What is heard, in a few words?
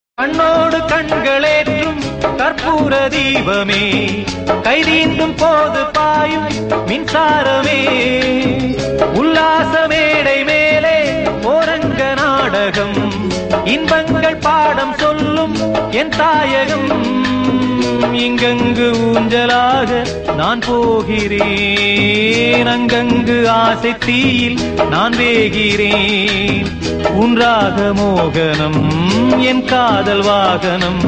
song